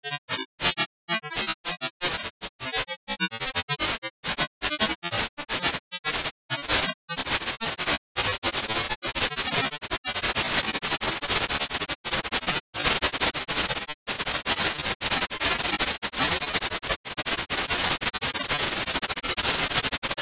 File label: granular test evolving